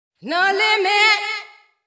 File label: VOICE GRL HOUSE 125 A, House Voice